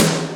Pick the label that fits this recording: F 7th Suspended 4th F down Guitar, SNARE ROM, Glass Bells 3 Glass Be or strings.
SNARE ROM